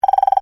Beep Buzzer Alarm, Scifi, Beep, Buzzer, Alarm, Short, Science, Fiction; Tech; BlastWave